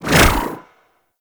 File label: melee long whoosh; Weapons; Prime Melee Long; Warframe